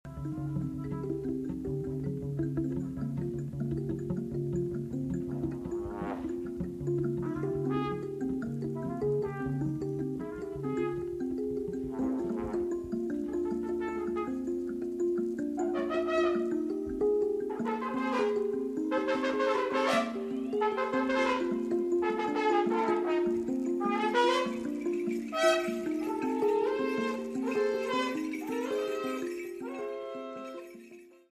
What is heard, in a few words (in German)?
Gitarrett Echo